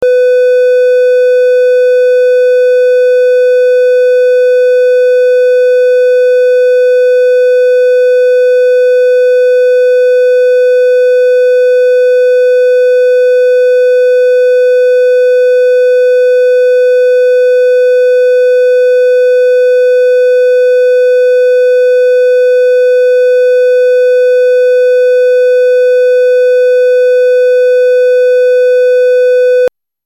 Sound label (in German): Dreieck 500 Hz